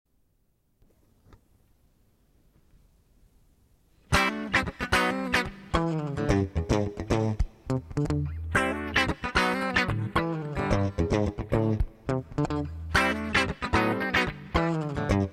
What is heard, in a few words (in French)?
Funk